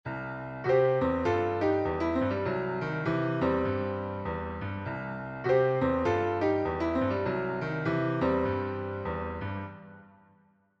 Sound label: run, Blues piano